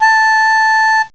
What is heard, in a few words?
MELODIUM; M8; CMI Factory